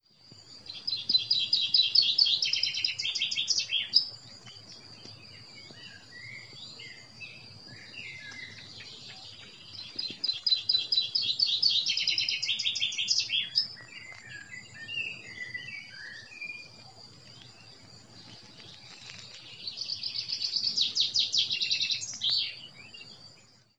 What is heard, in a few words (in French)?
pinson des arbres